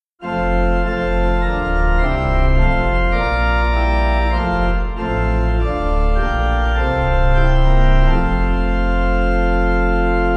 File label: In Full And Glad, St Alphege, Pipe